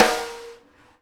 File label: Live Snares